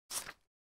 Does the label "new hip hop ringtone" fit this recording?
No